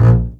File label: BASS A#1; AKAI S-Series CD-ROM Sound Library VOL-2